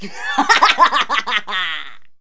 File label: laugh